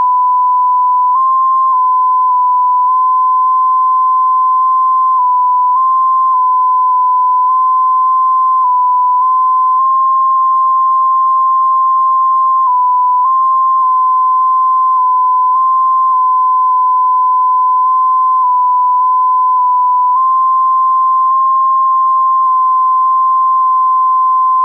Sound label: JT9C; from WSJT-X with default 1500Hz tone setting